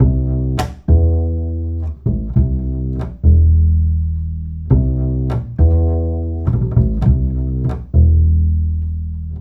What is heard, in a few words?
Acoustic Bass